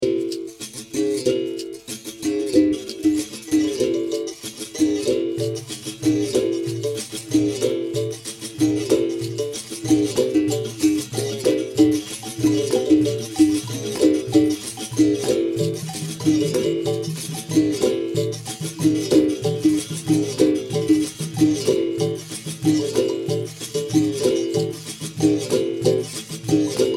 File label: Capoeira Angola rhythm